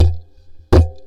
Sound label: Djembe cheap mic session